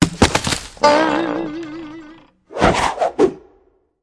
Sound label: trap level fail; The Croods